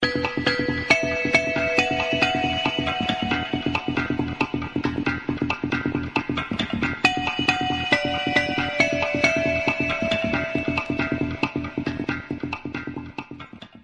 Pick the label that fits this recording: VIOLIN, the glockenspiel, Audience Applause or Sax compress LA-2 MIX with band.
the glockenspiel